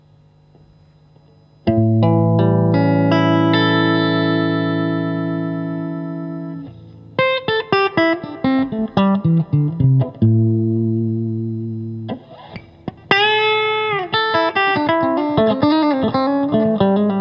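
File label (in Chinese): 60S MWS 美产铜线 清音 3档, acoustic guitar; kindman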